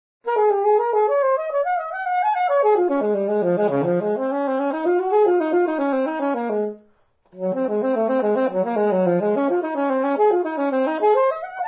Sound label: Saxophone; Zomboid; Lifestyle